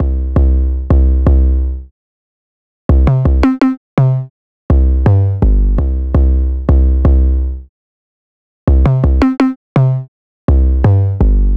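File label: 808 C minor 166bpm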